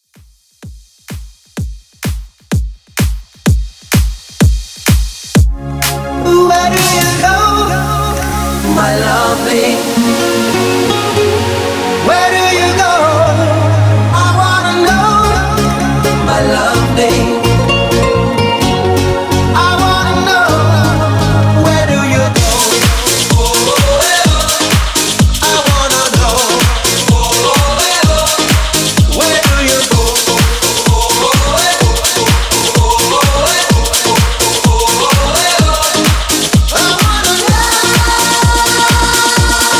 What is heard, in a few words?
Dance ReDrum 127Bpm